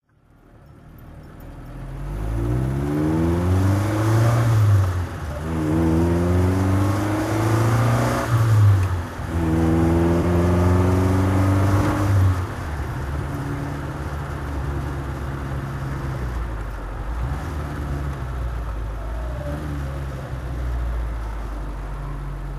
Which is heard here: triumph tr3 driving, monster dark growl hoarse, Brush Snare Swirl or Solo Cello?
triumph tr3 driving